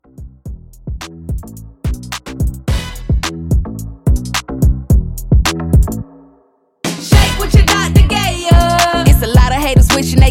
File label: Booty Hype Blend 108